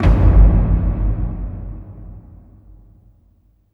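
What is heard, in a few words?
BIG BOOM, AMBIENT DRUMS; Spectrasonic Distorted Reality 1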